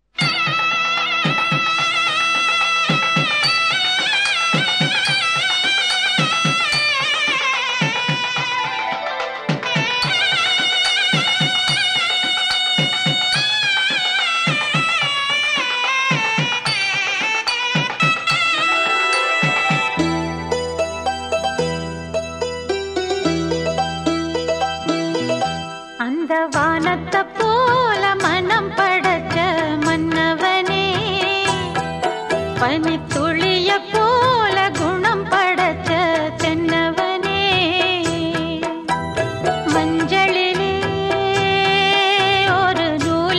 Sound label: Female, Tamil; Chinna Gounder